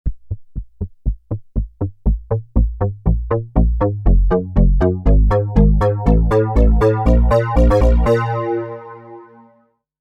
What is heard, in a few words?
DSS UNS VEL TO FILTER CUTOFF